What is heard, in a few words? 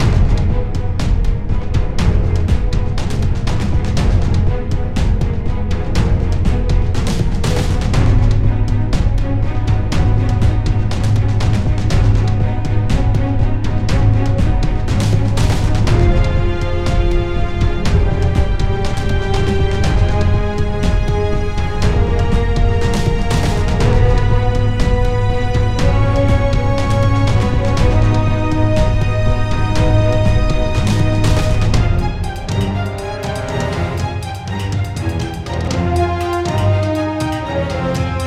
Battle